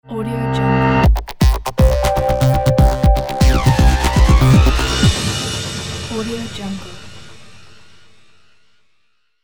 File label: market logo